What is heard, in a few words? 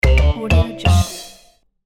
cartoon victory